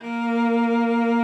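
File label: A#2, cello vibrato; Xsample VOL-7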